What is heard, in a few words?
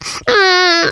China Miss Sound; Jolly Battle